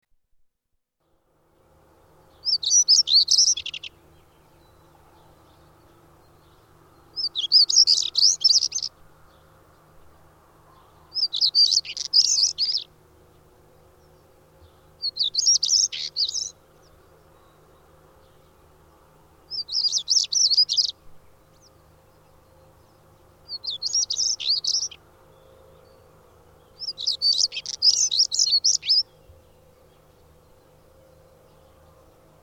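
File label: Saxicola torquata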